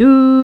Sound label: Do C5, Humans; Humans1